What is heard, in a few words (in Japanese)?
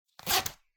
ジーパンのジップを上げる音